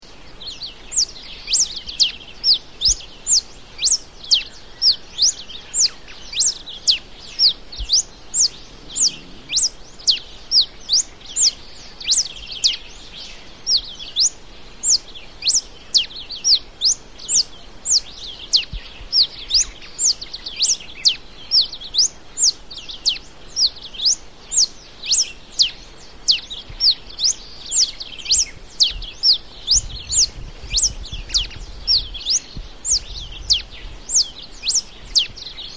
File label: ringed warbling finch